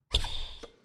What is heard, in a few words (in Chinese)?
摩擦, nba; 战中